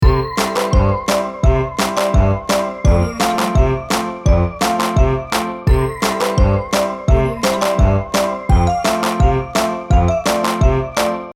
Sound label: halloween fun loop